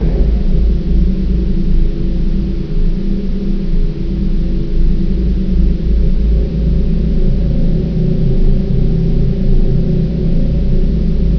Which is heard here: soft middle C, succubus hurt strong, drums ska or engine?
engine